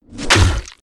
Item Projectile Stone

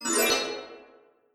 Skill Ultimate Healing

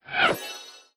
Rune Intense Healing

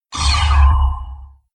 Skill Magic Shield

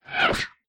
Rune Destroy Field

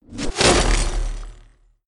Item Projectile Dragon Lance

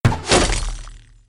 Skill Arrow

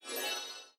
Skill Recovery

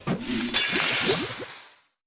Skill Envenom

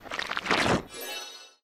Skill Cure Bleeding

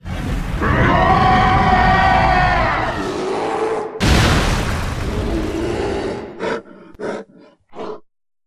Skill Werebear Form

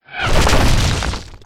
Rune Stone Shower

